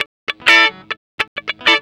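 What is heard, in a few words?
main guitar lick; E-MU Producer Series Vol. 8 – Platinum Phatt; Gtr Chords&Licks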